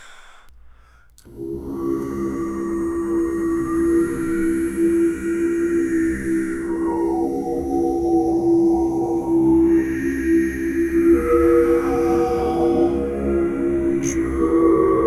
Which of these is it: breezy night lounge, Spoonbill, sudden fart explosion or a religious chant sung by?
a religious chant sung by